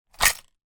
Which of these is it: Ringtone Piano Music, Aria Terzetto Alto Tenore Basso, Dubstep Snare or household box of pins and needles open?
household box of pins and needles open